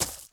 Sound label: bolt gravel, material; STALKER; IWP